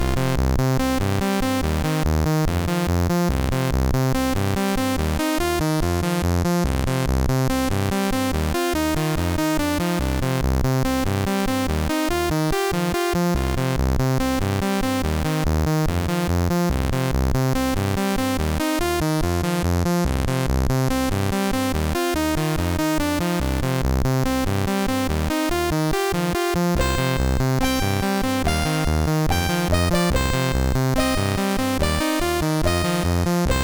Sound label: loadertunes, sid MOS6581R3; Vicious SID 2